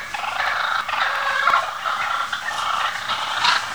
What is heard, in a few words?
fighting purrs, turkey; hunting